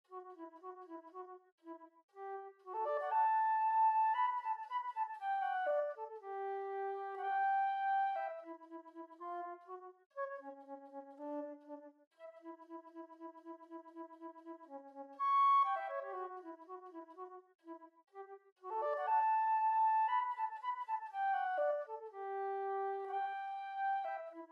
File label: Flute; Nutcracker Suite Dance of the Reed Pipes